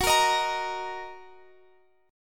F sharp 6th F sharp down; F#6; strummed

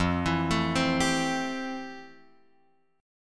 F Major 9th; FM9 Chord {1 X 2 2 1 3} arpeggiated

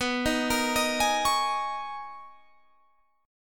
B Major 13th B Guitar Half Step Down

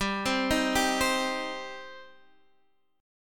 G Suspended 4th Sharp 5th, Guitar, Half Step Down

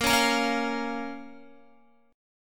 A sharp Minor A sharp down Guitar; strummed; JGuitar

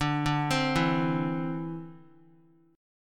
D 7th Suspended 2nd down Guitar; D7sus2 arpeggiated

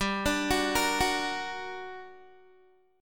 G Minor Major 7th, down, Guitar; GmM7; arpeggiated

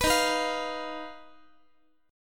Eb Suspended 2nd Sharp 5th, Guitar; Ebsus2#5 strummed; JGuitar

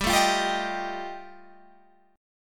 G 7th Suspended 2nd Sharp 5th G chord

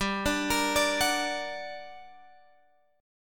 G Minor Major 7th, down, Guitar, Drop D

